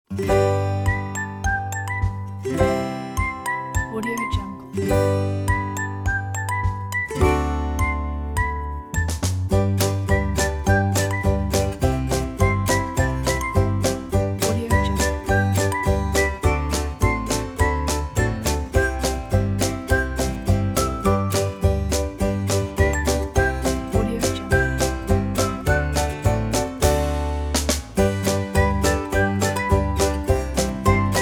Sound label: clapping ukulele whistle